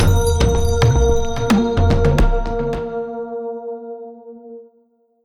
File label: basicMood Cueva Bosque